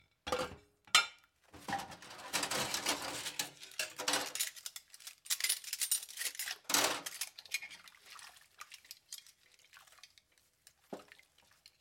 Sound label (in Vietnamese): Tieng lay muong nia dua sat ra khoi chau cho rao nuoc